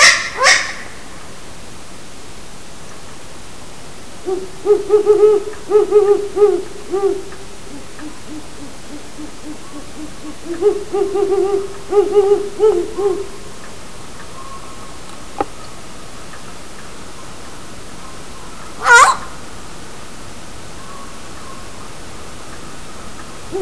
Great Horned Owl and Young; Great Horned Owl and baby 2; zoology

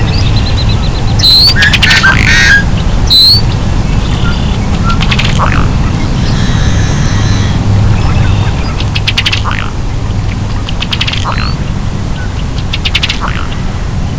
ruddy duck

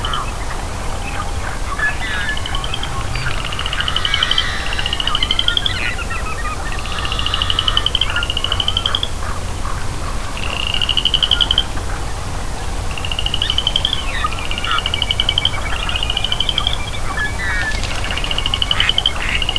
boreal chorus frog; Boreal Chorus frog 2009

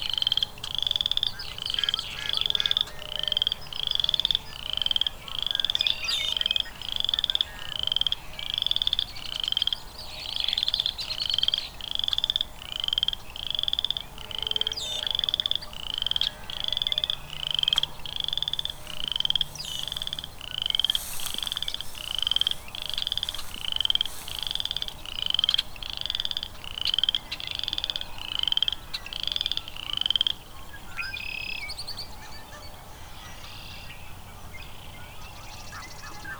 boreal chorus frog; Boreal Chorus frog 20010

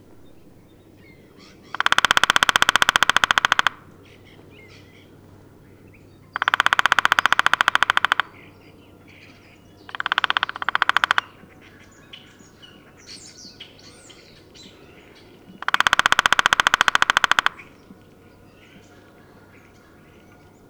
downy drumming; Downy Woodpecker drumming (large file)2010

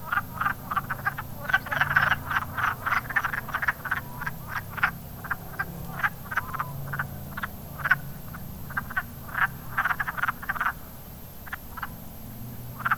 wood frog; Wood Frogs 2010

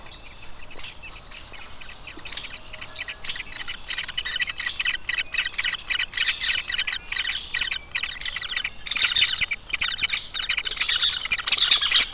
Hairy W Baby; Hairy Woodpecker (baby); zoology

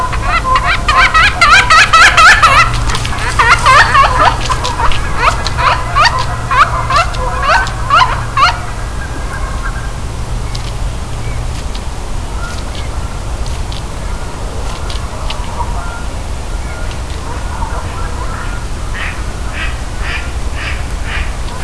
pilea mating; Pileated Woodpecker (mating)

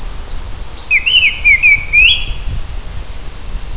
oriole; Oriole 2008, version 2; zoology

